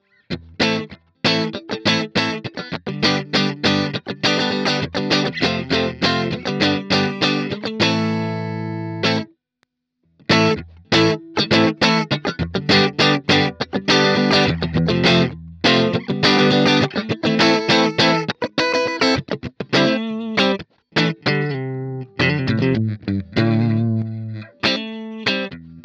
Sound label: dirty pedal boost; vox mv50 ac test